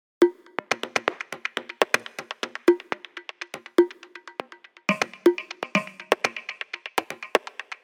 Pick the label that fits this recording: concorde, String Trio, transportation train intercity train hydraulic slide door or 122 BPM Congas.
122 BPM Congas